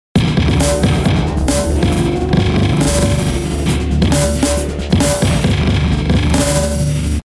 stretch; sugar bytes; effectrix

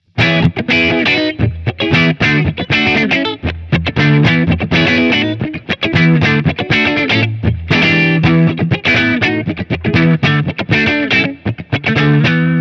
crunch middle gibson, guitare; custom77 cs4; guitare-lp

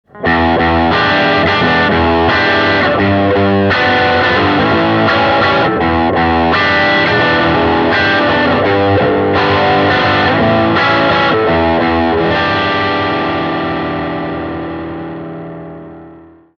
les paul voicing; overdrive; belle distorsion; moelleuses, rondes, pleines de basses; fender super champ x

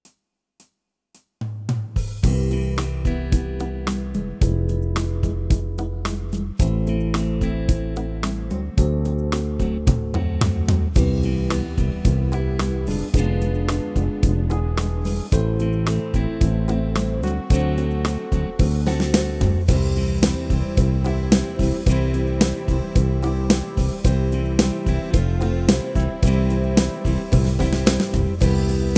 style pop and rock easy pop, yamaha tyros3

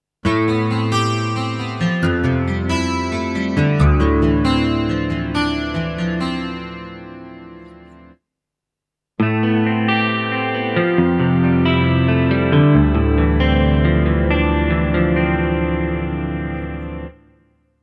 guitar

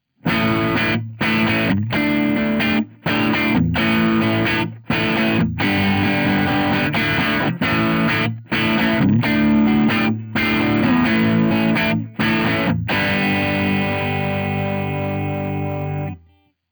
crunch middle gibson; guitare; vintage electric

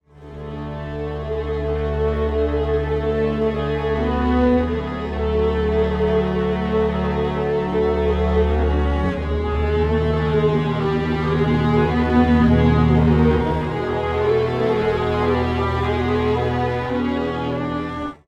strings, timestretch

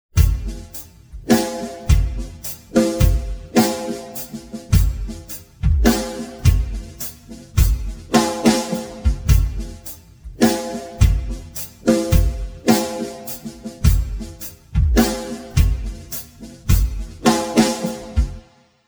drums; serato pitch n time pro; timestretch

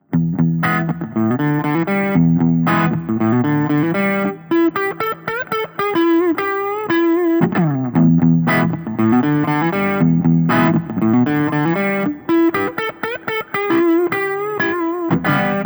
crunch neck gibson; custom77 cs4

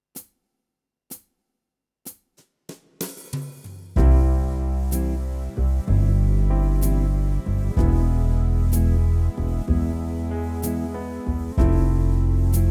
style swing and jazz dreamy ballad, yamaha tyros3

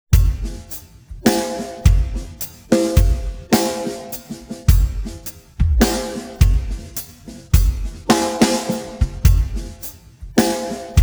drums; prosoniq time factory clear scale; timestretch

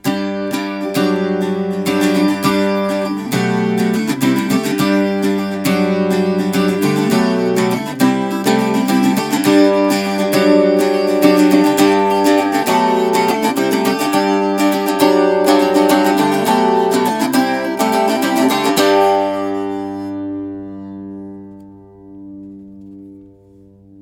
bouzouki variphase; audient mico

